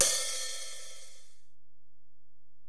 HiHat, Alesis DM-PRO

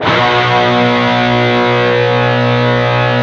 PRS, Guitars

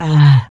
VOX, VOCALS; Ueberschall; Jam Box

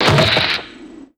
Newmatic; Zero-G; Total Drum Bass

